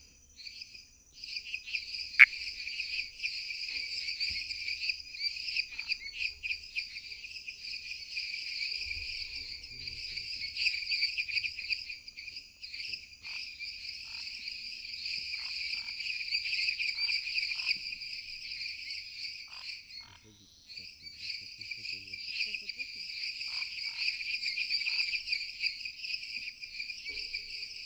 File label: Pithecopus araguaius